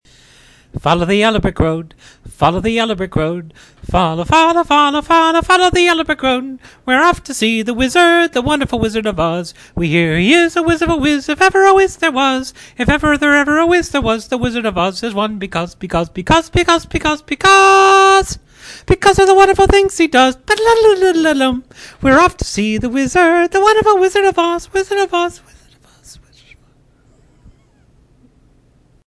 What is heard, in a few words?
singing; wizard of oz